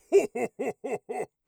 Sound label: laugh, orc voice; Orc Voice Pack